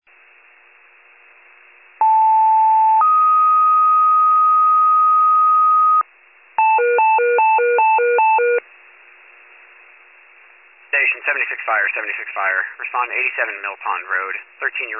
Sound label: FIRE pager alert